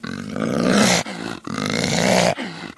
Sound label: mtushkano aggressive; STALKER; customnpcs